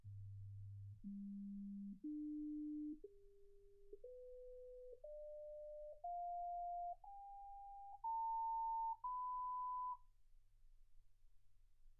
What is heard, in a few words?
sinus tones 48kHz